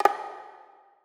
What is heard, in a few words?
percussion oneshot spark; Pop; Drums; SD PASADENA Pop Sample Pack; Slate